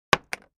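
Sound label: Mech Metal Object Move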